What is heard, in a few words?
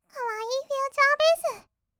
kawaii future bass; 音楽ジャンル; 1200 kawaii voice!!